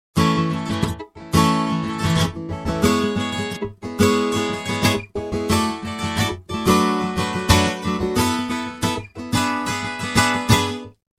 Chord Mode Steelguitar

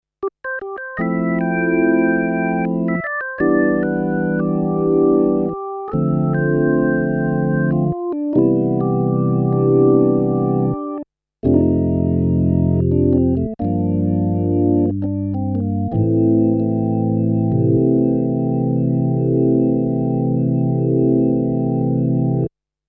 Orgel

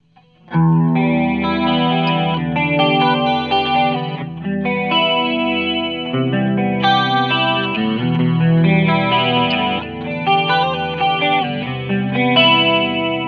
Chorus Mikrophon

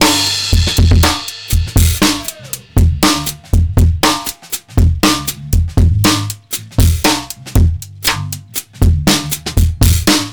Subgruppe Saturate My Kit

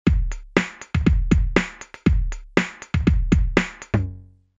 Loop mit Klang Kling Filter

